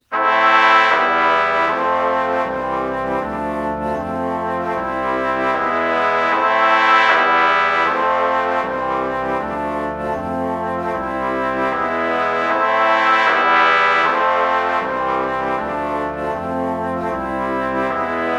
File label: alto flute trumpet chord morph